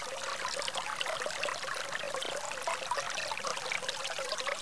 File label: water; Flashpoint Ultimate 13; manzanar